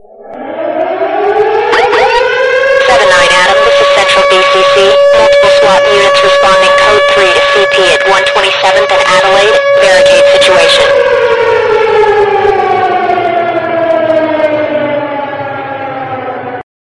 alerta policia; toques para celular

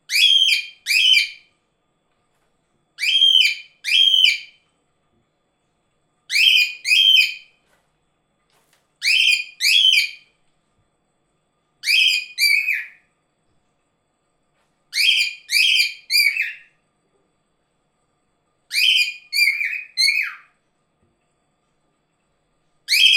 calopsita efeito sonoro

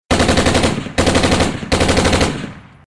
tiros m14, barulho de tiro arma m14

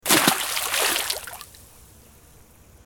caindo na agua

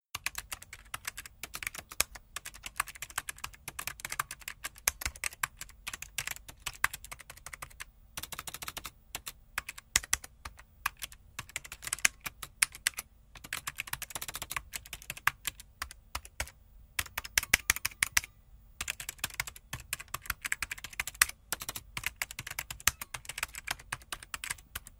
digitacao efeito sonoro

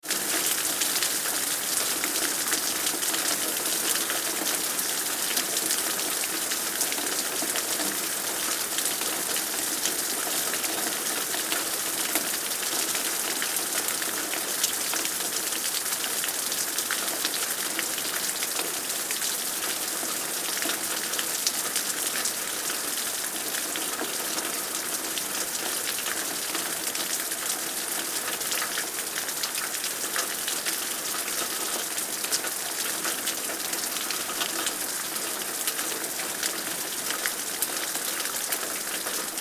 chovendo muito

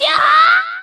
karate ataque

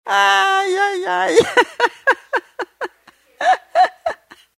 Risadinha; Chaves

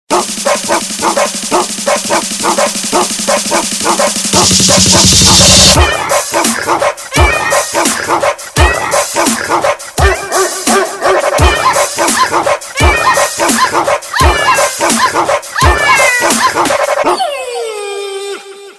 sons animais caes e gatos

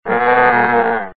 Vaca; som de vaca

